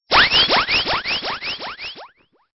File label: Stinger; STINGERS